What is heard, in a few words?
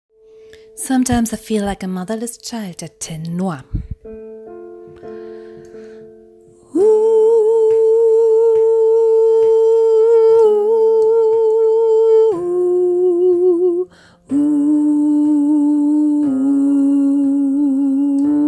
Tenor; Sometimes I feel